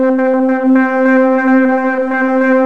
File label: short wave, Yamaha W-7; Rubber Chicken Software Sample Bank